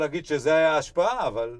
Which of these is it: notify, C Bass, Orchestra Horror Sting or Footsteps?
C Bass